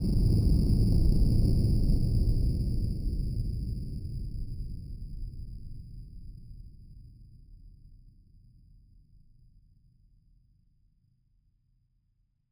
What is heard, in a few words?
Landing